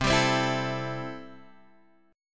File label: C 6th C down, chord; C6 strummed